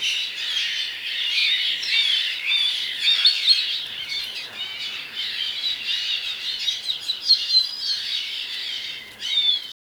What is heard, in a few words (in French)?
une centaine doiseaux sen, take off